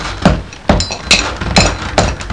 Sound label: Building